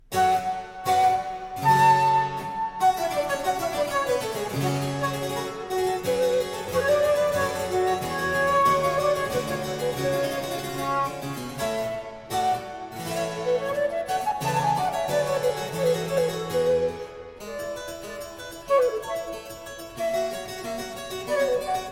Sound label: Flute Sonata, Gayement; No. 2 in G Minor; Op. 91